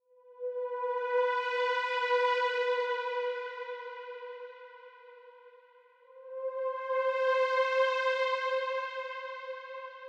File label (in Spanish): ritmo